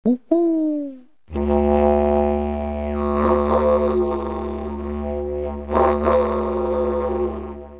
owl, didgeridoo, Hoo.. Hooo Hoo…Hoooo; bush pigeon is similar in nature to the owl; Timeless Productions; didgeridoo tutorial

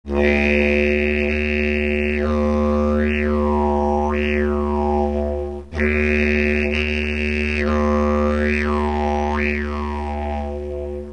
aeiou2, didgeridoo; Timeless Productions; didgeridoo tutorial

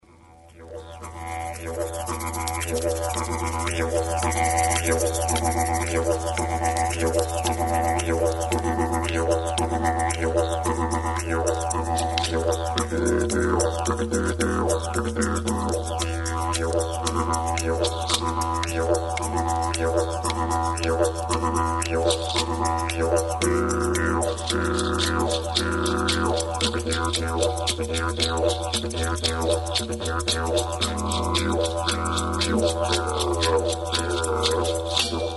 Trance Around The Fire

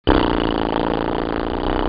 lips, vibrating your lips loosely; Now try vibrating your lips loosely by expelling air from the lungs using your diaphragm.; We'll use this loose lip technique while blowing into the didgeridoo; Timeless Productions; didgeridoo tutorial

